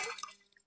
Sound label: splash